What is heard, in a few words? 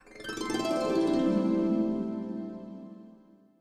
Perfect Harp